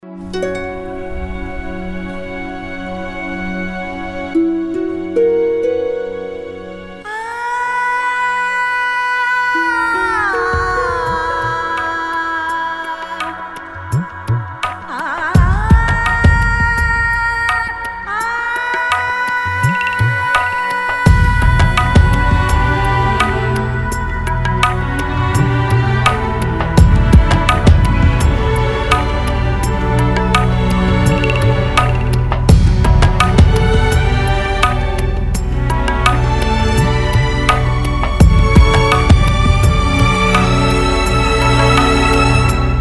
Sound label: Instrumental Music